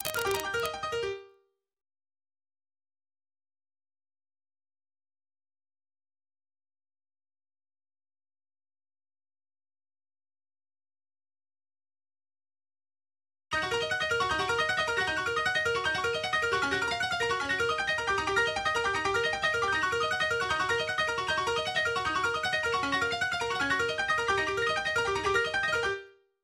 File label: Drop Arps WET